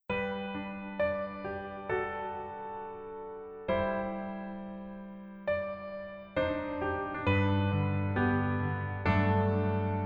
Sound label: Piano ver